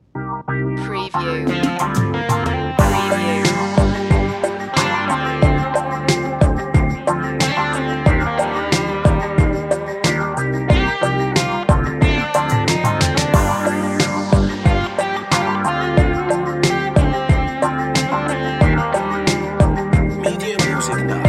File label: mellow retro